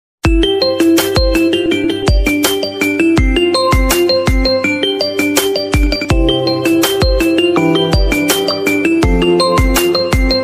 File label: Xiomi Mi Tabla Remix Ringtone; ringtones